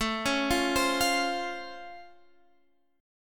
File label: Db Augmented Major 7th, A